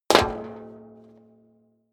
step high heels metal rattle jump; schritte

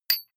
medium metal impact medium scrunch

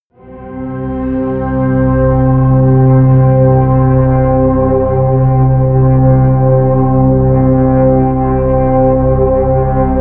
drone giant pipe

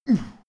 male hit soft

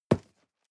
cardboard box medium grab hard; objekte

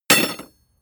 metal tools drop; soundpool

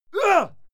male fight attack hit; soundpool

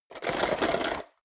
metal box small shake rattle short; soundpool

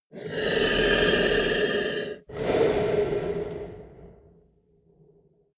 monster snore breath single; comedy-cartoon; soundpool

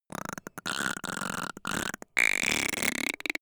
creature quack long; kreaturen

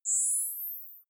zoom in light

